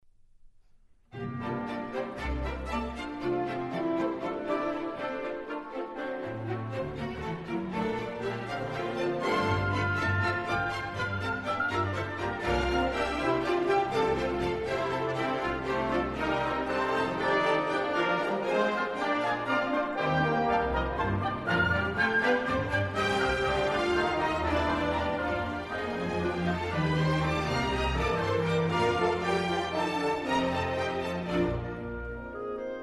Train Music

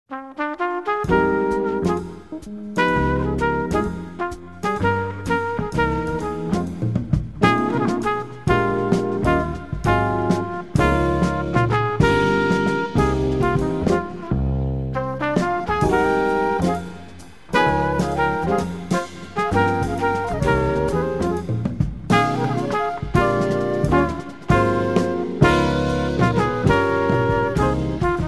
Funk Freeze

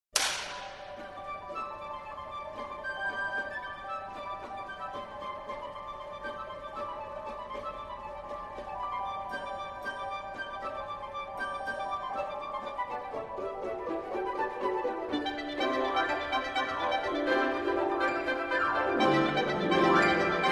Piano Concerto; ravel

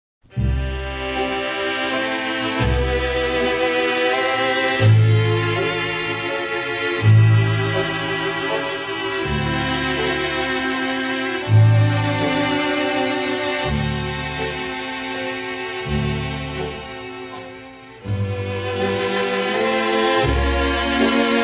Melody Love

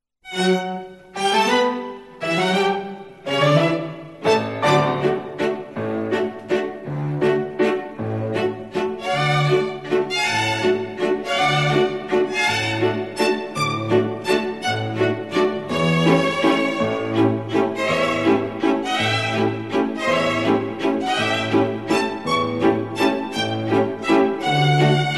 Waltz

